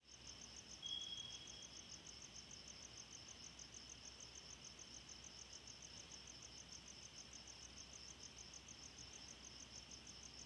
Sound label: night alarms; a representative excerpt of the recording; the full recording is an hour long and was made at night, when the chirps are most audible